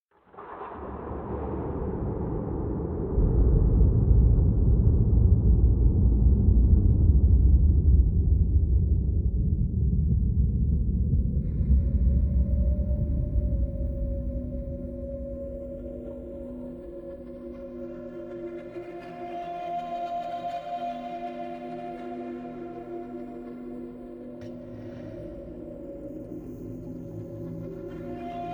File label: world ambience; factorio; Factorio 0.14.21